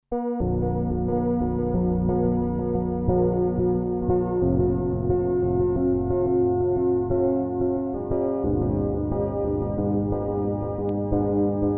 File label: Cobalt8 Sine Scape